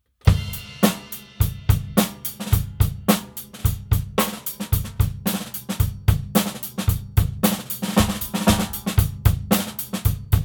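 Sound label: ghost note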